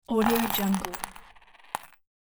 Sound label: foley coins fall paper surface; domestic sounds